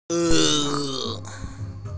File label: ergggh